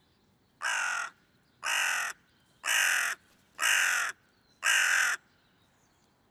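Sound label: hooded crow corvus cornix cornix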